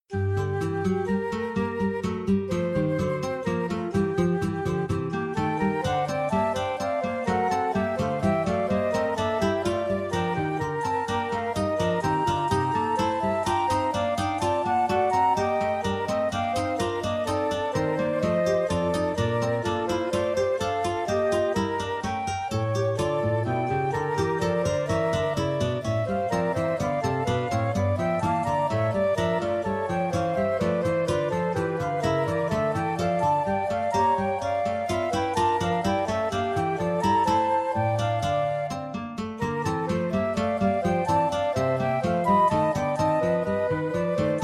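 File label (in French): inventions pour guitares flûtes; bach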